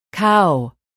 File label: cow